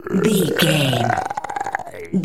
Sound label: zombie voice groan croak